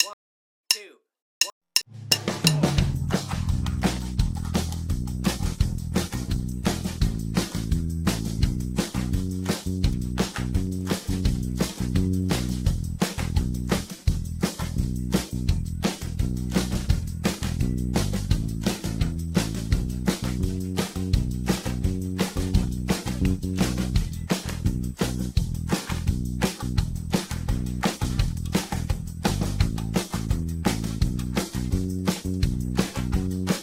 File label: christmas guitar solo; backing tracks; chicken pickin